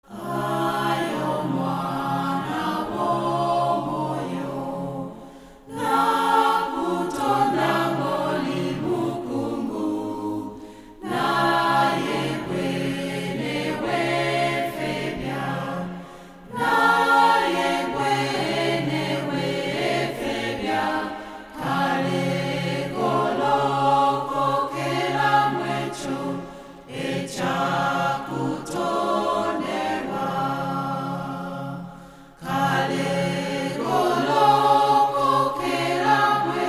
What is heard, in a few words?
full voice